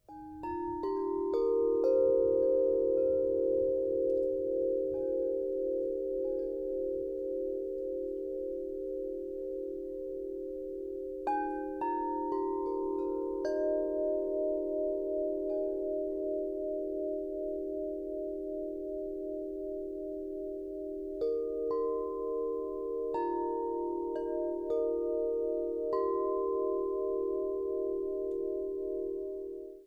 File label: Corinthian Bells Wind Chime 65 Inch Copper Vein